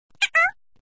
mouse

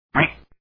duck

